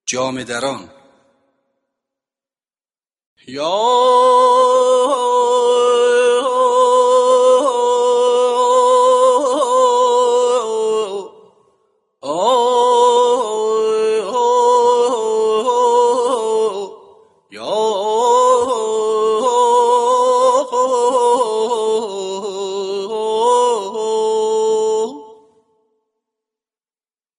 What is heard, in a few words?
jamedaran, afshari